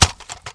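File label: grass; Mafie 1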